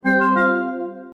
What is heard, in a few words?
player join